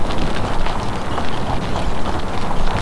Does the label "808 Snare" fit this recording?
No